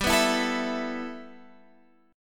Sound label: G 6th Add 9th G, Guitar Half Step Down